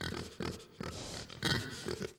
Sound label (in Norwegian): grynt